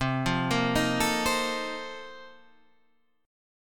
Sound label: A sharp Major 7th Suspended 2nd Suspended 4th C